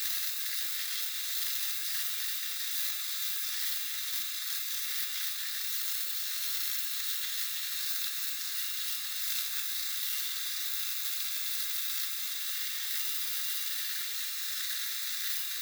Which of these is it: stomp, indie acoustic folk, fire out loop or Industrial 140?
fire out loop